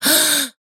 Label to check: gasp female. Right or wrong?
Right